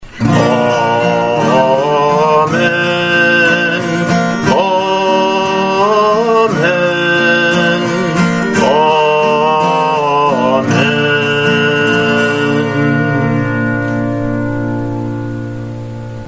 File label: Amen; SMMC Service Music; Ordinary Time